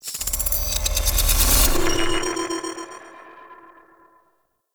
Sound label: Arachnoid Wraith Overcharged Retract; Warframe; Corpus; Abilities